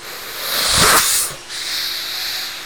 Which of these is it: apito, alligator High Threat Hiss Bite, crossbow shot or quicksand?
alligator High Threat Hiss Bite